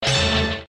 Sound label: ching; milliondollarman